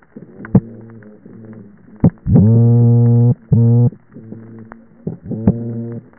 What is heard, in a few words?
Toadfish; Staaterman